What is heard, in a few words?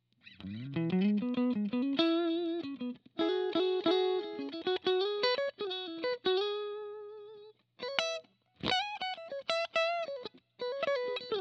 mid and neck clean